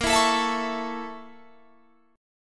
A sharp Minor Major 7th Flat 5th A sharp Guitar Drop D